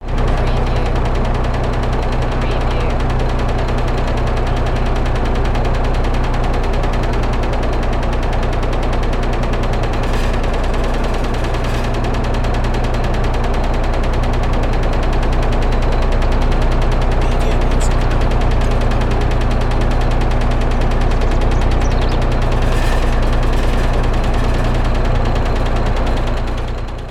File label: Old Window Fan